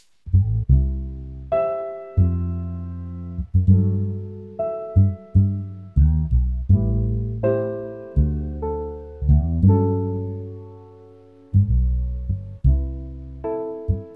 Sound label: engine room humming, jazz; night crossing jazz